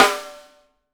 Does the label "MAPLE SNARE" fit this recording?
Yes